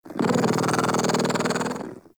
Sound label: Kitten Growly Purr; Warframe; Catbrow